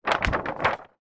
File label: large poster movement